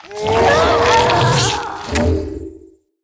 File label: Ancient Shell Attack, Infested Barks; Enemies